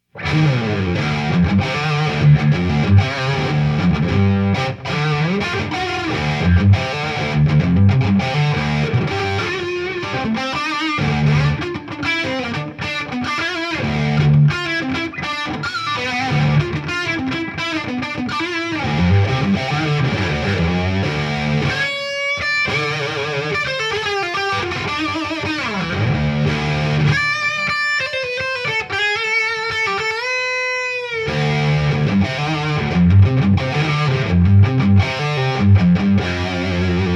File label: Reezafratzitz Medium Gain; EMMA